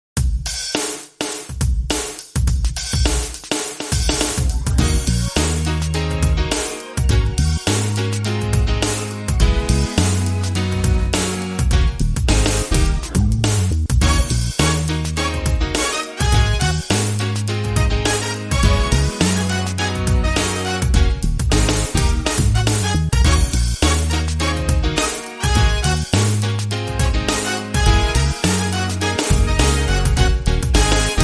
Phunky Horn

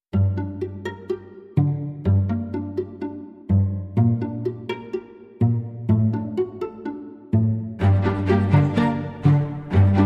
Classical Tango

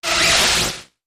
Noise Screamer

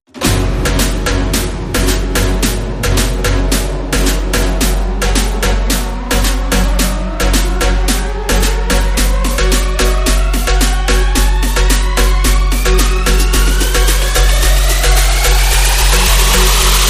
Moombah Trap Underscore

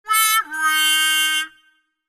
Trumpet Classic Wah Wah

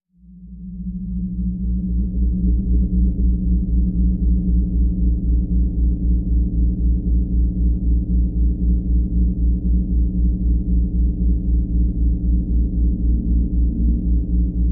Dronecraft Sting

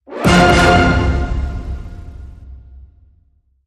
Hit Orch Glam Double F

